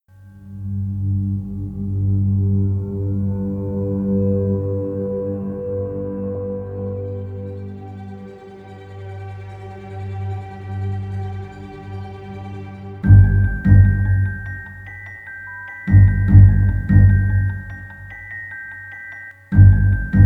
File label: Suspense; A Man Without Power; KemSynth